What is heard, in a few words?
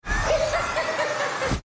laugh; joker